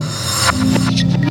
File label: Rev Fx; Zero-G Jungle Warfare 1, 2 & 3; 90 s Sample CDs